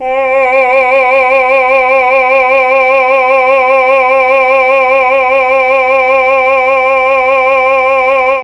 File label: C3 CHAMB MAL, Mellotron, MALE VC; Mike Pinder - Mellotron Archive CD; CHMB MALE VC